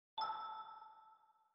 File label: interface success